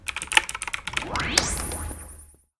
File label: keyboard; p00gie